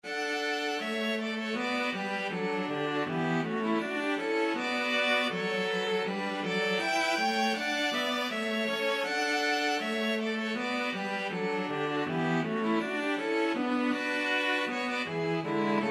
hornpipe string trio

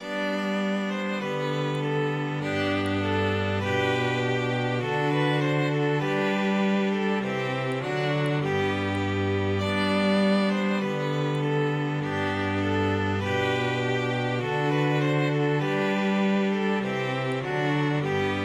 deck the halls, string quartet

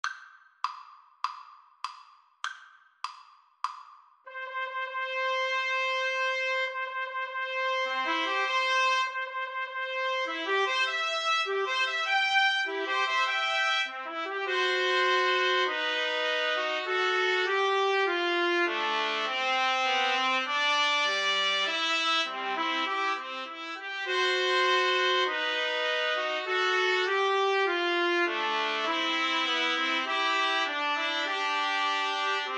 wedding march TPT TRIO, Trumpet Trio

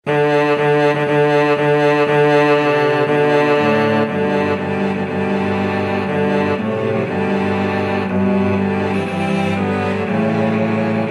cello quartet; wagner; wedding march

